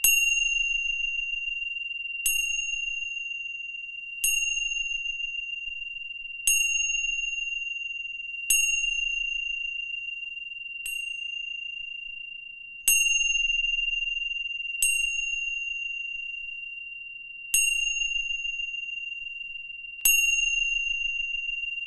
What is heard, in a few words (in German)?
Zimbel lang